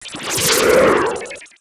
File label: Absorb; Fly For Insanity